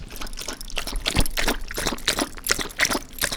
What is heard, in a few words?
drink